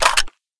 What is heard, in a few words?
mag out, m16 weapons; Urban-Coalition; Decay; arccw ud